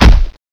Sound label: footstep sound; zerosiki; slender